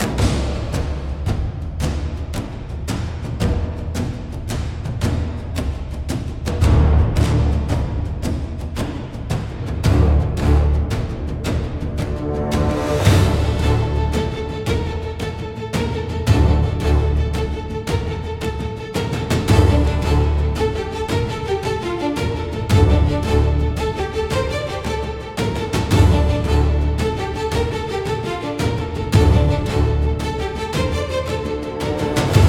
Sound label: Thunder on the Pier, cinematic japanese style battles